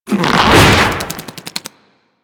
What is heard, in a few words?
Infested Devourer Movement Whoosh E; Warframe